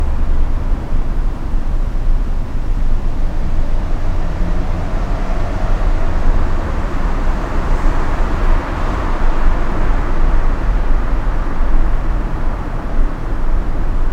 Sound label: short tunnel road